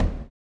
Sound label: STOMPS; Spectrasonics; Vocal Planet; Blues Soul